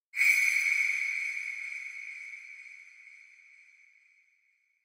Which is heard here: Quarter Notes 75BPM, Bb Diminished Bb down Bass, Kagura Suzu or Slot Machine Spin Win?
Kagura Suzu